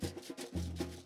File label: loop sambao